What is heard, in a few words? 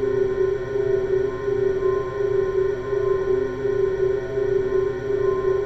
Cars Hover; Hover GP; Megacity